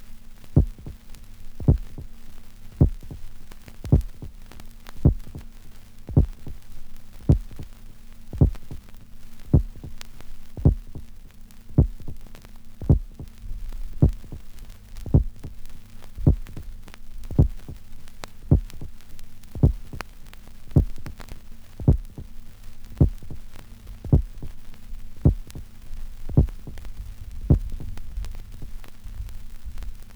electronic heartbeats - vinyl; Equipment used: TTA05USB akai player and focusrite 8i8 interface, using an SSL limiter; Recorded from Sound Effects - Death and Horror rare BBC records and tapes

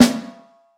warm sounding steel snare drum sound b key

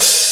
studio open hat sample a key

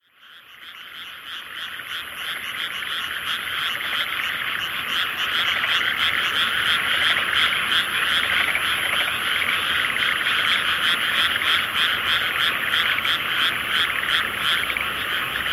various frogs

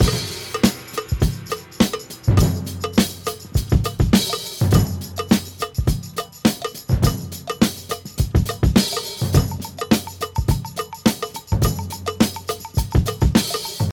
138 bpm modern jazz breakbeat sample b key

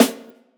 loud snare drum sound a sharp key

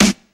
bright snare single hit g sharp key; snare drum samples

